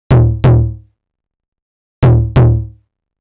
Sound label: drum loop hardcore percussion 125, Classic Chicago House; Sample Magic; Drum Loops